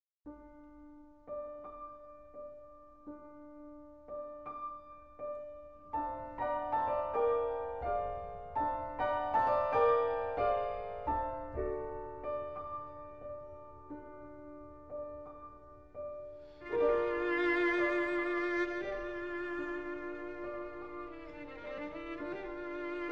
Vocalise-étude en forme de Habanera in G Minor for Cello & Piano; M. 51; Arr. P. Bazelaire